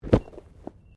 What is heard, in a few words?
Body movement Fall Medium